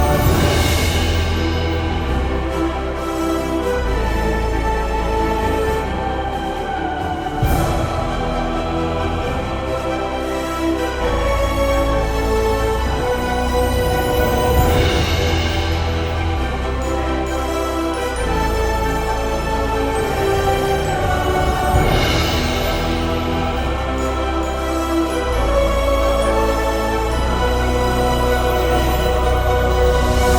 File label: Epic Medley Orchestral Cover; THE AVENGERS